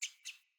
Bird Double Chirp; Paragon Bird Guide

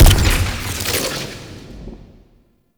Noctua Alt Shot Punch

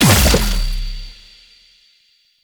Fusion Pillar Pulse; Warframe; Qorvex

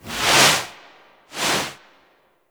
Hub Apartment Foley; Warframe; Zariman; Cinematics

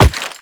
Run Rock, Footsteps

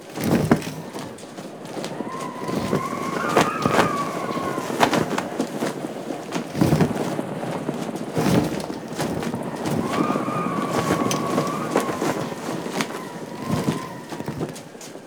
Interior Silo Bed Loop; Eidolon Plains; Grineer

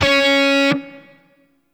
Shawzin Oct Three C Sharp; Warframe; Grineer

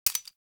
Mech A; Tenno; Dual Soma

